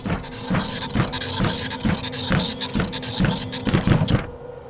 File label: printer; AKAI S6000 CD-ROM - Volume 6; Tools